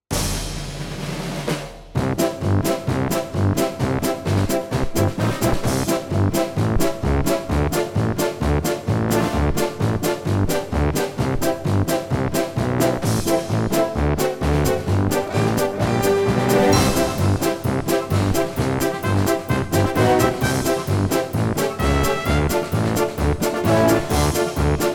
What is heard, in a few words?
Music